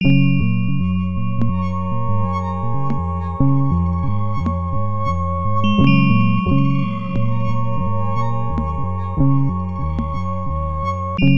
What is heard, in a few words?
Bubbly